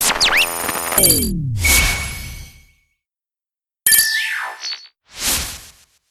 noise

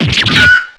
damage

